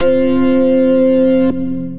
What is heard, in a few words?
lead organ; Synth Lead; Ableton; Factory Packs; Beat Tools